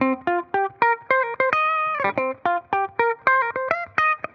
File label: Tele, Guitar, 110bpm, C, dusty funk; musicradar